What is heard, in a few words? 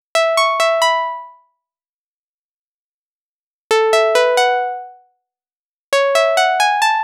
135BPM Pluck DRY, F# minor; Melody & Synth